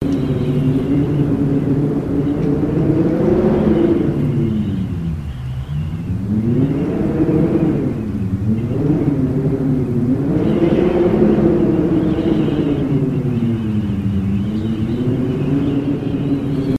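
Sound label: WIND Interior Drone Whistle Door; Emitter LP; The Unfinished Swan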